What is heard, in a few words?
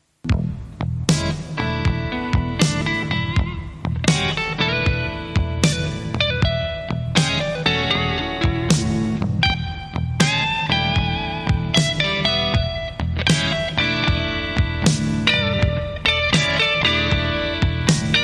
Steely Blues